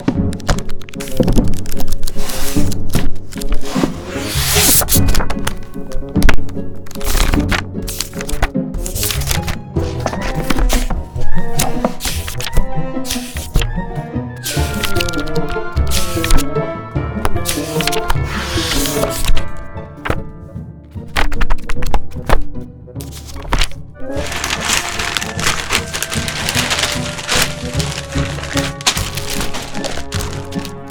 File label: isolated ambience loops sound fx; epic stock media